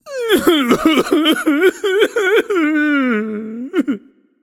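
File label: non verbal boohoo, voice; cernunnos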